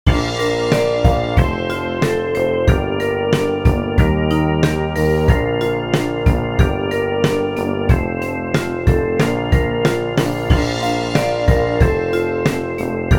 Bb C Scale Study

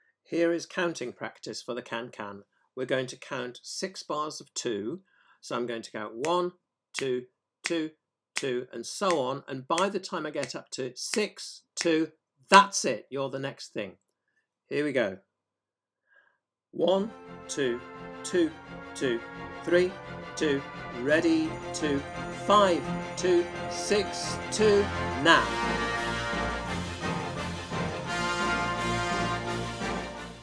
Bb Can Can COUNT; simplybrass

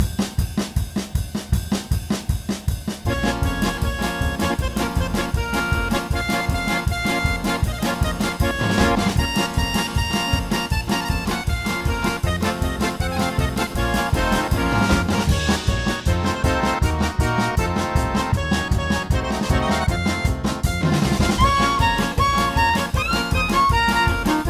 Bb Klezmer Dash, cornet

